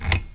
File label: pick; ragemap2024; grunt